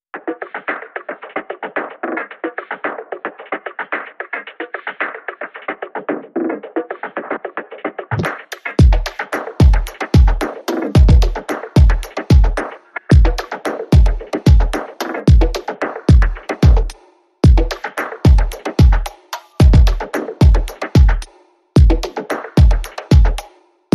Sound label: No Hats No Ride Reduced Percussion